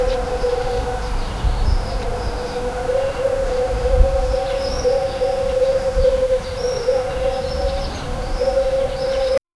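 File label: drone aerial morning ambience, kids playing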